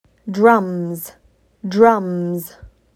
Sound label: Drums